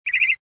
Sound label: Folder Close; Samsung SCH-i539